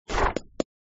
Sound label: task list open; gardenbloom